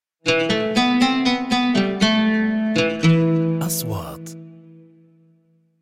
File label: LOGO